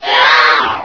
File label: race pain